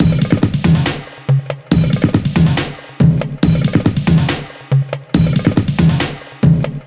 drum loop, Dubstep; Dubstep Sample Pack

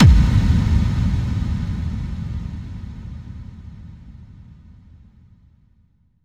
Bassdrums FX, FX Kicks; Vengeance Samples

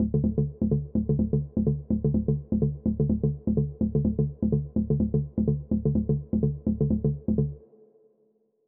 126BPM Seq Root D, TechHouse; Connectors; Vengeance Samples; Bonus TechHouse Kits

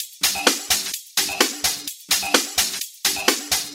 Minimal Loops

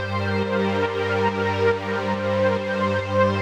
Pad 140BPM, G minor; Melody & Synth; Vengeance Samples; VTS1 Devastating Truth Kit

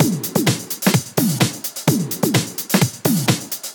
House Loop, 128 BPM; Vengeance Samples

